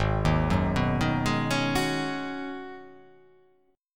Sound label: G sharp Minor 11th G sharp, chord